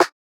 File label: snare